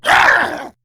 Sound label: Attack, Battle Barks